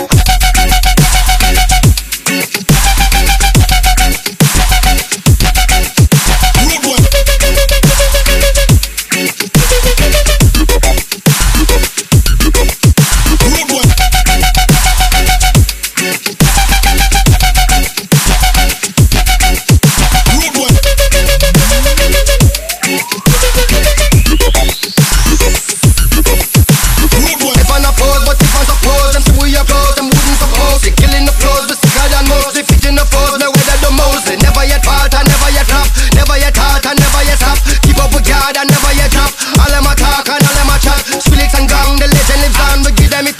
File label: dub loop; weapons; bender